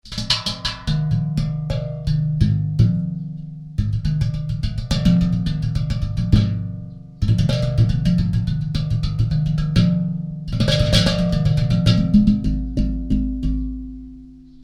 slit pan

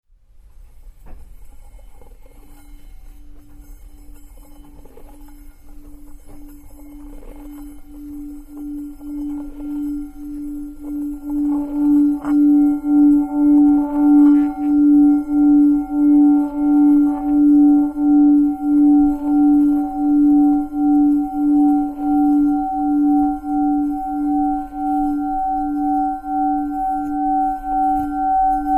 singing bowl mix

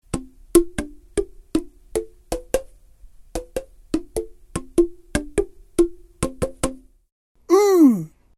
rainbow tubes voice

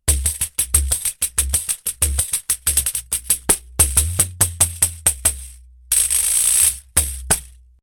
pandeiro

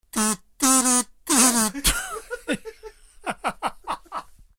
kazoo

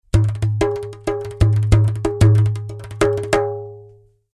darbuka ceramic

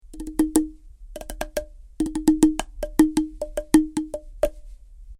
ceramic mini bongo

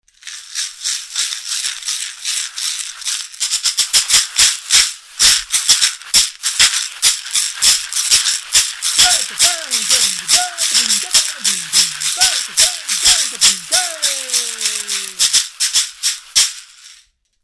caxixi

